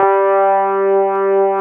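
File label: Wurlitzer G2 - R; USB Soundscan vol.09; AKAI; Keyboards Old School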